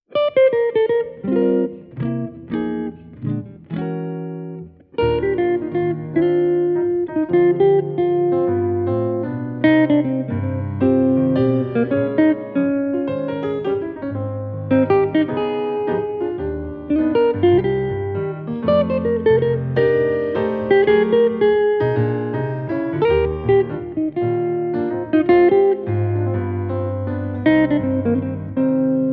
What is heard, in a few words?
Winter Waltz